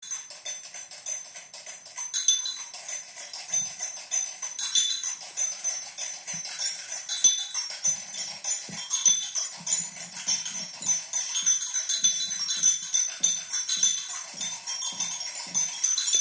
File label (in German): Klingelbecher